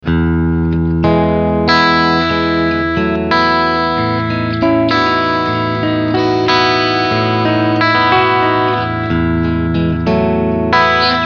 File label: Cleansound Front HB SC Mode